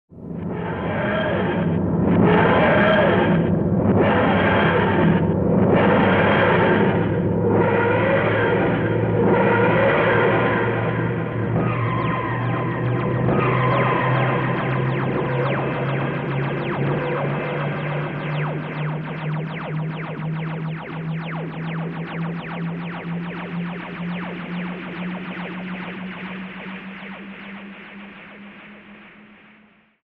peremeschenie; tardis

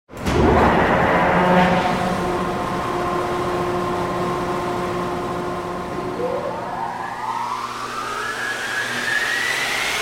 zvuk promyshlennogo elektrodvigatelja

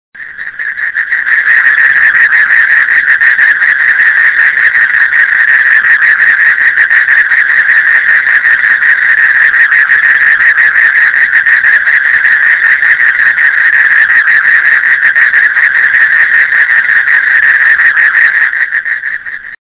chernorubcovaja zhaba

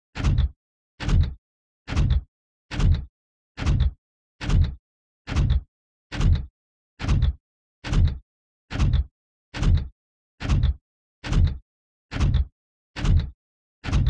magnitnye sapogi

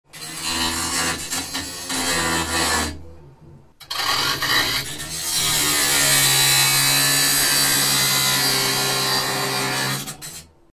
zvuk bolgarki

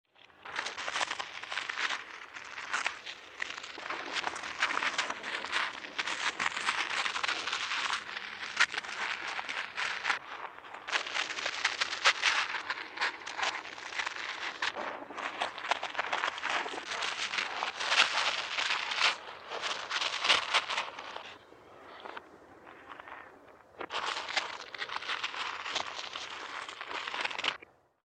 polzuschaja cherepaha

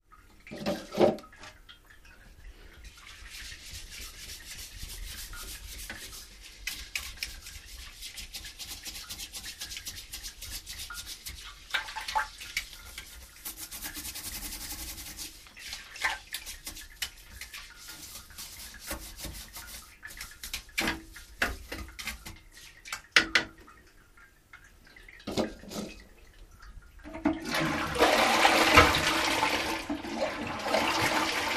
chistka unitaza

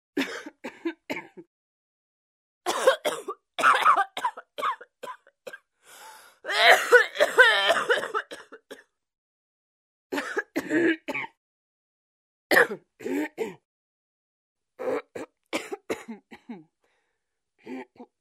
zvuk zhenskogo kashlja

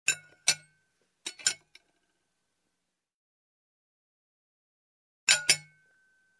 vilka i nozh na tarelke

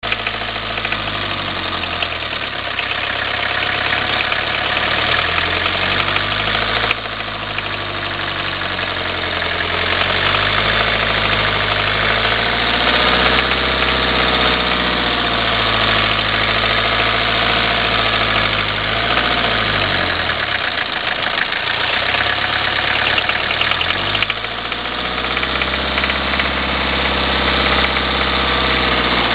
stuk forsunki tojota korolla

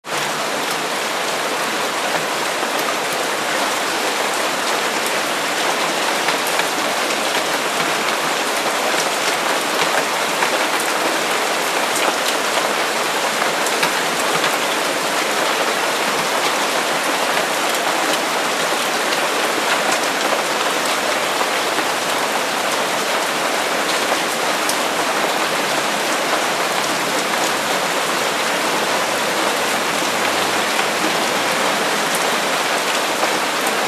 dozhd po kryshe

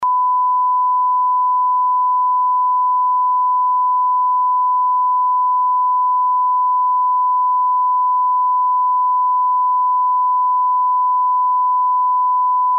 zvuk zapikivanija

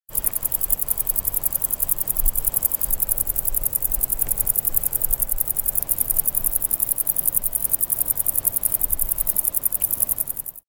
strekotanie cikady

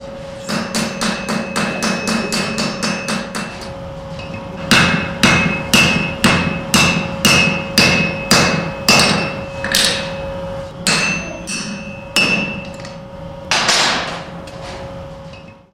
stuk molotkov v kuznice

